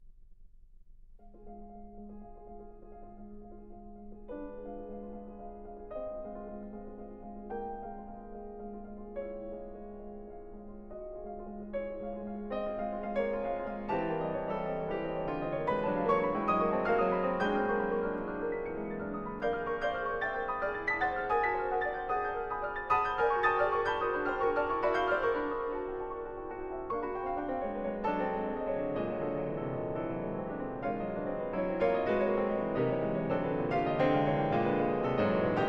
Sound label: etudes pour piano; galamb borong